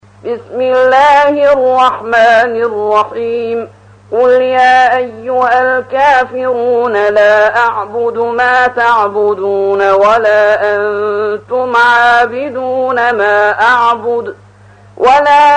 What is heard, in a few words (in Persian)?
ترتیل سوره کافرون